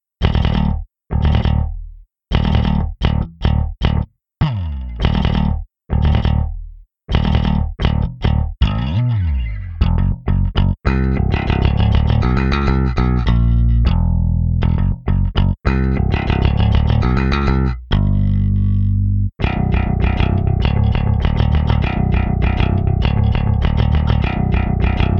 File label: metal bass only